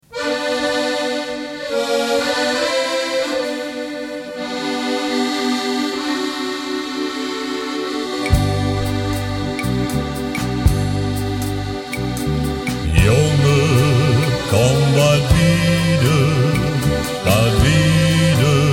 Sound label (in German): Shantykoor